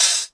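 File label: Yamaha RS7000 hihat cymbal, Drum machines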